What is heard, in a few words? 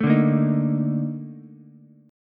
A sharp Major 11th Ukulele, chord; A#M11; strummed; JGuitar